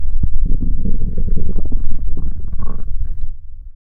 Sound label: Stomach growling